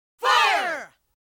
Fire; Saban

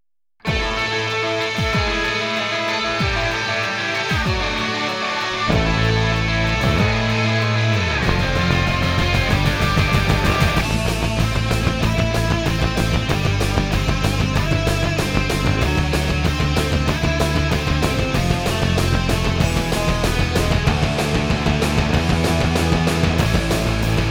Instrumental Theme; Power Rangers in Space; Fox Kids Countdown

